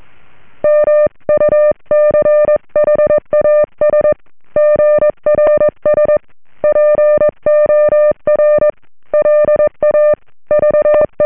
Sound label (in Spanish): saludo, morse